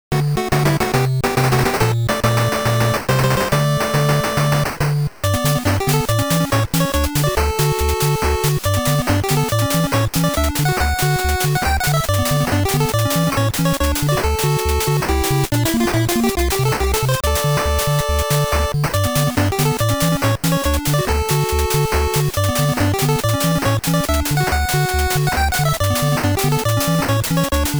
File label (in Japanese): ファンファーレ; Chrono Trigger famicom sound version 3 2009